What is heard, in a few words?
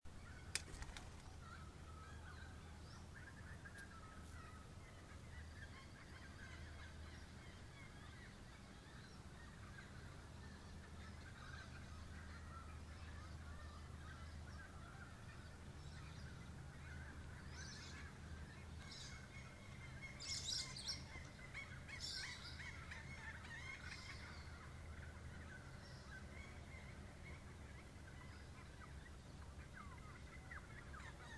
morning birdsong